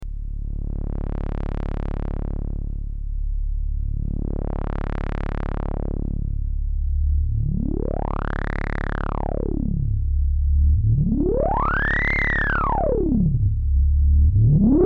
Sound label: low saw, synth, ladder svf